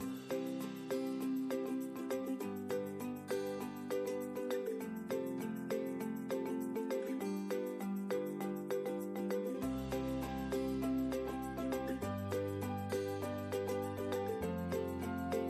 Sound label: music cheerful; bgm